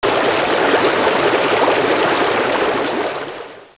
Water, 水声; 特酷声音素材库

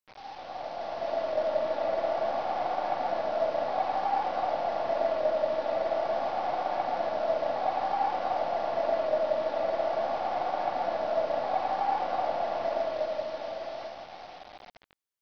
Wind; 特酷声音素材库; 自然; 风声

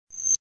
screech; FlashChat v3.931

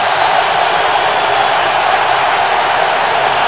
Stadium; 暴力赛车

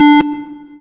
start; 暴力赛车

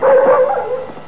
DOG, 狗; 特酷声音素材库

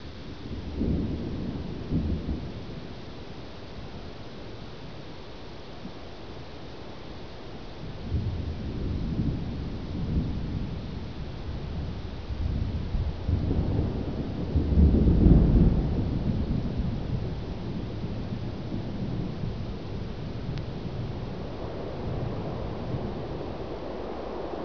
Rain, 雨声; 特酷声音素材库

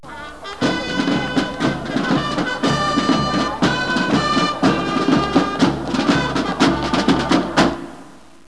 Joy, happymusic; 特酷声音素材库; 背景音乐

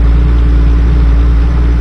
PickUp; 暴力赛车

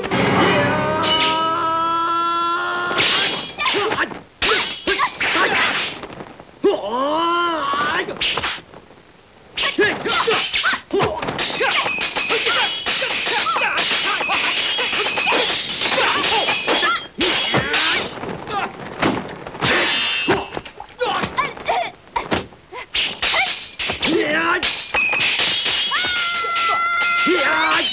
Fight; 特酷声音素材库

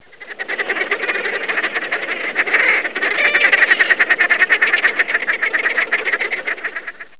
Bird; 特酷声音素材库

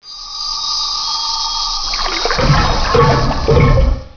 Water, 水声; 特酷声音素材库

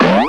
Cartoon; 特酷声音素材库

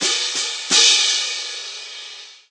Joy, happymusic; 特酷声音素材库; 背景音乐

